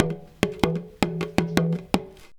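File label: small tama groove; Best Service; World Colours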